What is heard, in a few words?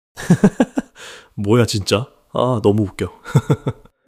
laughter